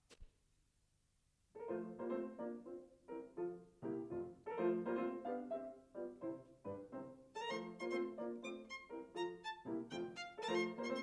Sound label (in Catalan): Sonata per a violí i piano; L.V Beethoven